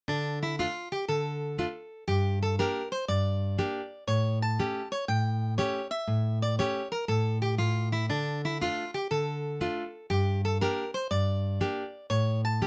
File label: gypsy song